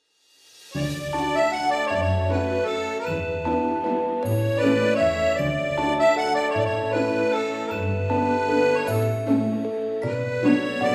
Romantic French Music for Love Story and Wedding Videos; Tamers Music